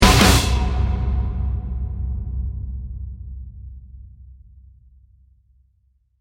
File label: start action, music